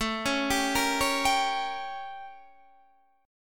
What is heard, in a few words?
A 7th Flat 9th Guitar Open D